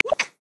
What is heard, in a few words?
Yunnan Snub-Nosed Monkey; South West Mountain